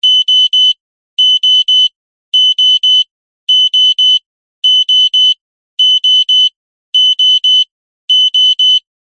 household alarm clock small high pitched beeps loop